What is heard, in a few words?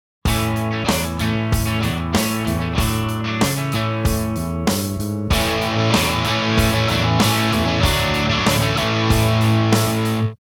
High Clean